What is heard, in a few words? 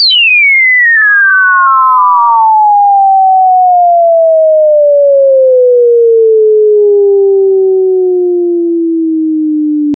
soft volume, tone